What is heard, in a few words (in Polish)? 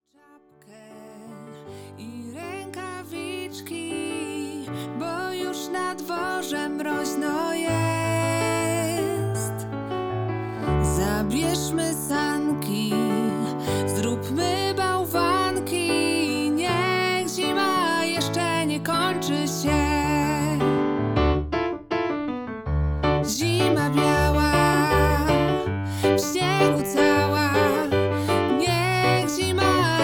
wersja wokalna